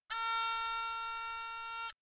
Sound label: haegeum